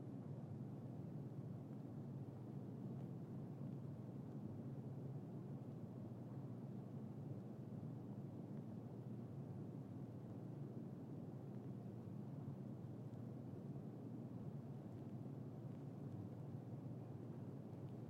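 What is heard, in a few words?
sounds of room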